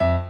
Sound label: piano sus